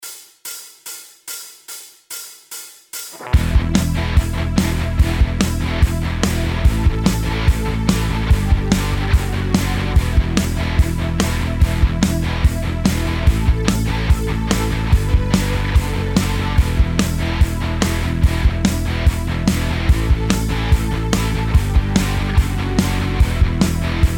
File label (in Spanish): Solos una cuerda acompañamiento